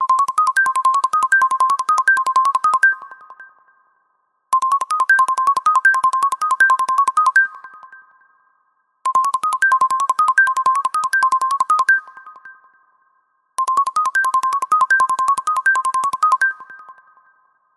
Minimal

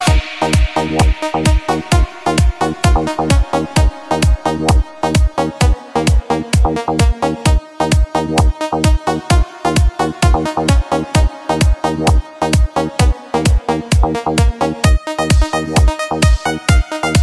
House Tech

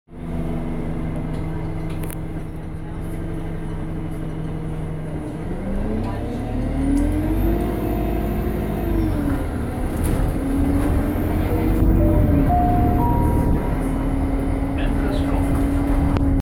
Bus engine

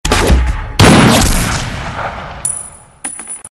Bullet Sms

